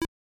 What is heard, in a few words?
Fireball Extinguished; nes; Mario Bros.